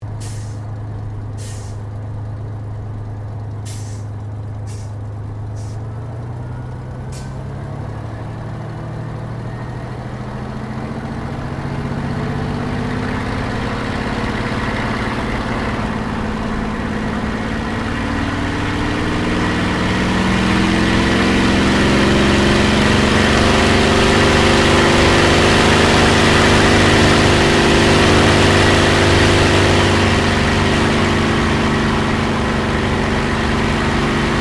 AIRCRAFT SUPERMARINE SPITFIRE MK-XVI TAKEOFF INTERIOR; Airplanes; Airplane Sound Effects